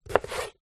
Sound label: pear chop slice knife wooden chopping board